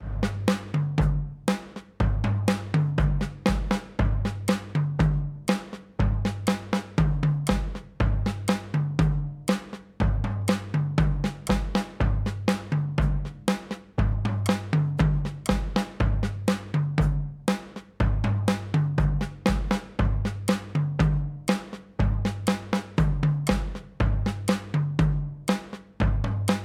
Original 50s Drum; Soundtoys

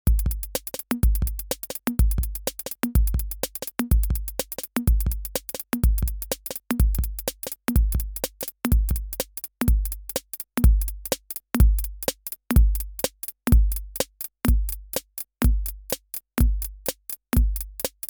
Tip Top Audio Circadian Rhythms Swing

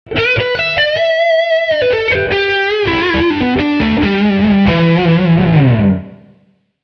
Lick Front Humbucker

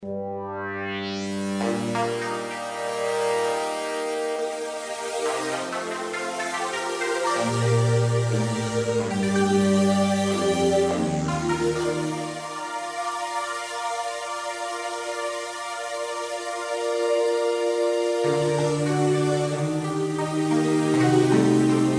Chorus and Choir

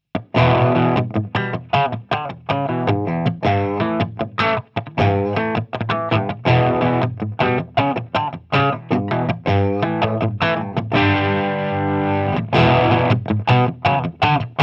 Crunch

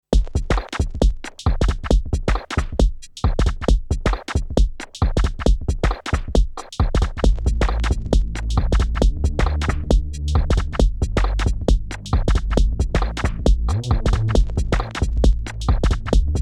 Roland TR 8S Pattern

